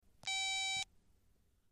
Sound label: Telephone; Cell Phone Connect Key Beep.